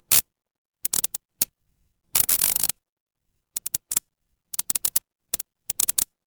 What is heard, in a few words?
fire electric arc